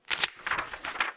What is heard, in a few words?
Page Flip